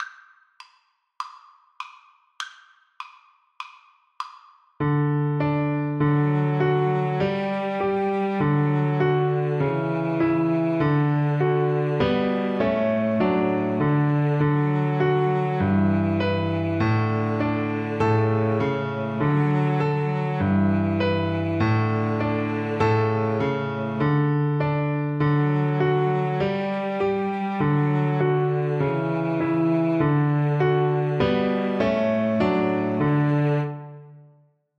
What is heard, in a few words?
Piano Trio